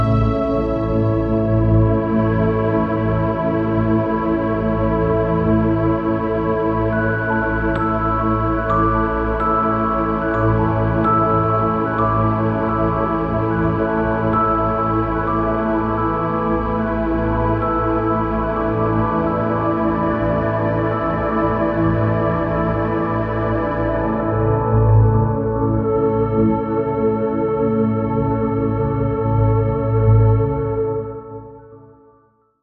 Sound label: Atmospheric Happy Drone